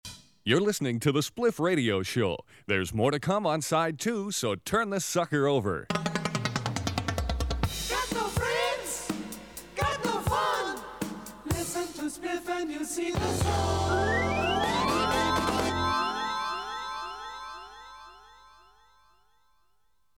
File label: jingle